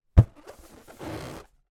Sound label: foley balloon inflated handling